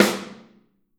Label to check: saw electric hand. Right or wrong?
Wrong